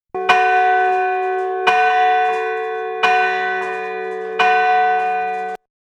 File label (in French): cloche; Dissay